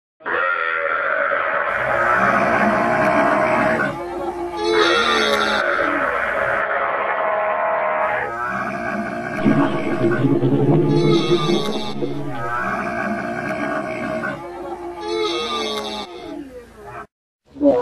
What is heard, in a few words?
Camel Sounds Grunting